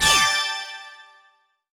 Download Finished

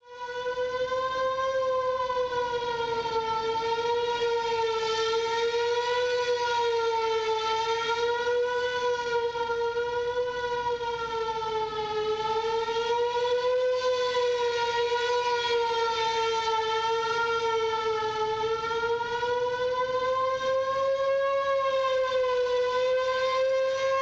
Fire Siren; Badlands Crew

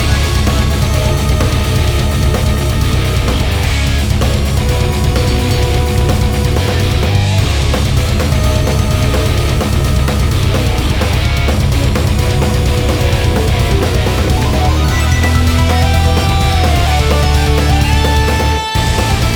Music Underground Boss; Elsie

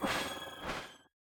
Counter Activate; Marv; Going Under

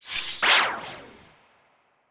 Se Sys Topleague Ratedown

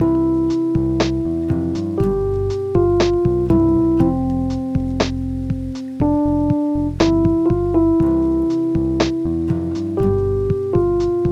menu; Lofi Room Demo

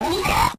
Goblin; Goblin Old

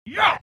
Mom Vox Grunt; The Binding of Isaac

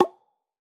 Calender Stamp

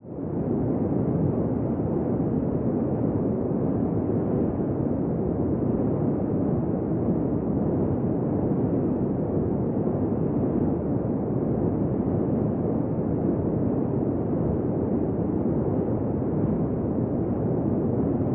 Hollywood Wind drone

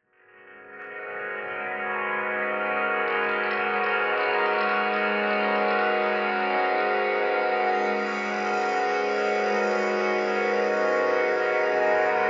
Pad Starbed G#

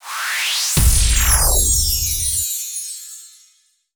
Gacha Card Get

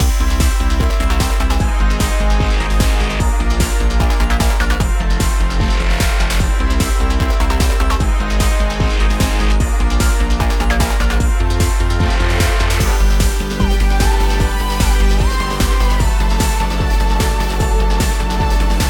Space Track Loop

